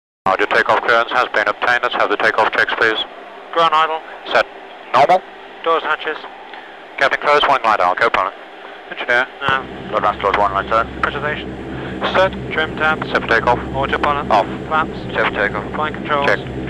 Prop Plane; Interior; Flight Check, Hercules Int; Flight Check Procedure From Interior Hercules No Engines.

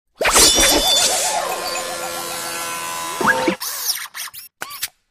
Cash Box Bell Small Se

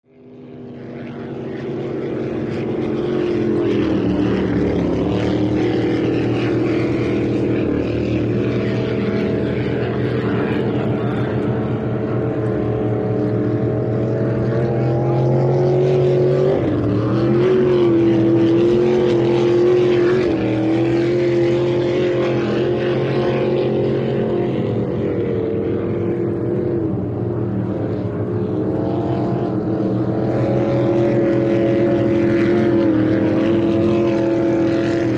Boat Racing Amb, motorboats

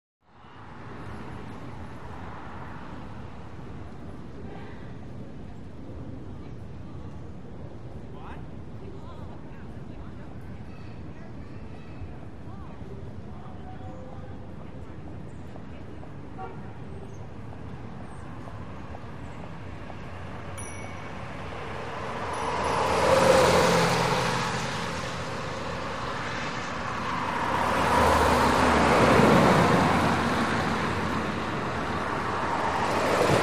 Car Bys Close Left To R; car & truck bys

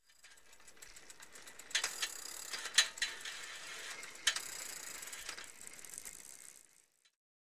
Bicycle Ten Speed Bic

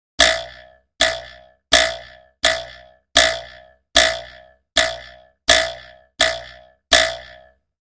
Bouncing Twang ( Wood ); Wooden Bouncing Twangs.

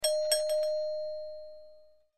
Single Tone Door Bell

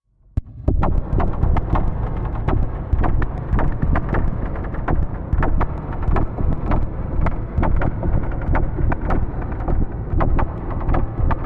Boards In Wind Machine; impacts

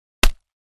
Body Punch; punches kicks hits bones

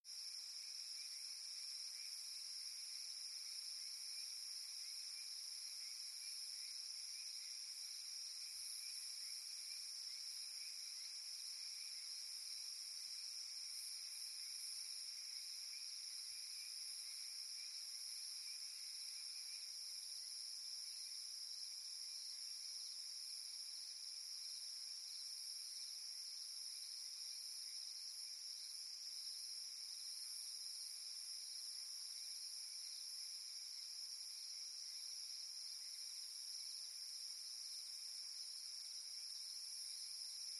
Night Time Mississip, cicadas

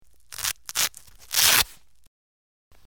Velcro Rips Close Perspective

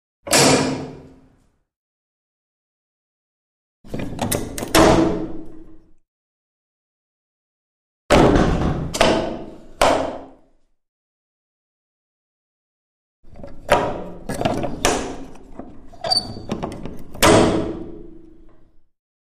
Large Front Door 2 Bol, latches

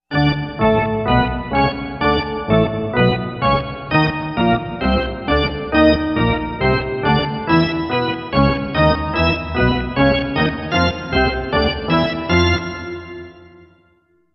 Pipe Organ March; charge

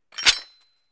Metal Animal Traps

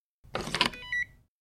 Swipe Key Card Alarm; hi-tech-various

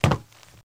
FS Runner On Metal, singles metal

